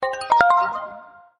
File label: htc notify dripple; ringtones